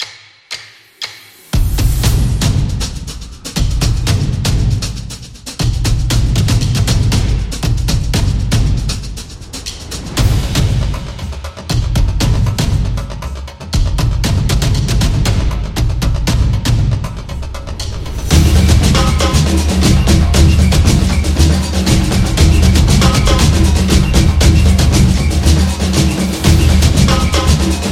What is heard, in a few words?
Samba